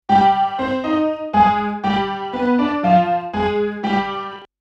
Piano Chorus

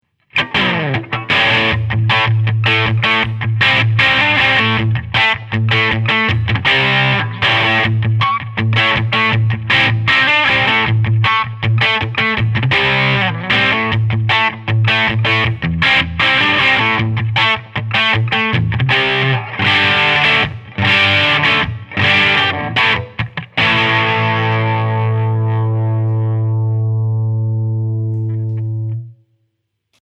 Bridge Crunch

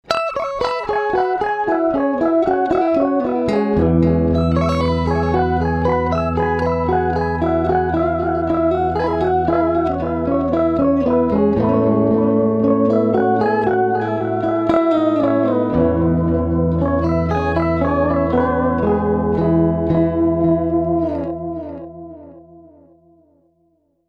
Octaver Delay Line

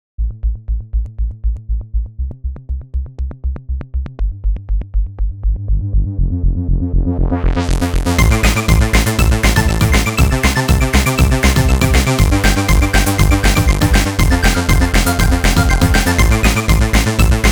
electro punk

